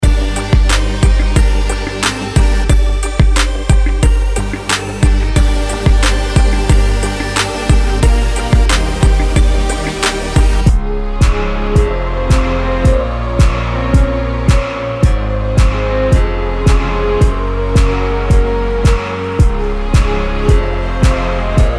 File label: HIP HOP; HIP HOP MADNESS